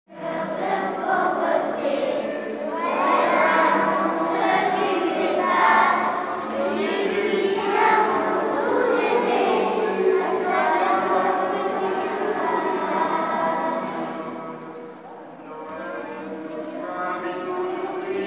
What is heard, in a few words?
Vocal